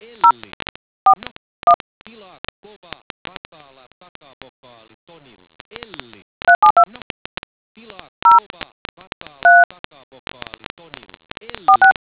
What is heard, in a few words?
dtmf